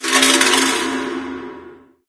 debris random, ambient

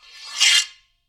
draw greatsword; Mount&Blade Warband

